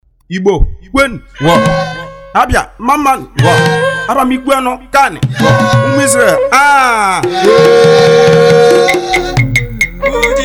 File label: Igbo War Dance